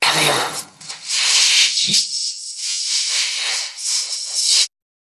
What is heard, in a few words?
jingle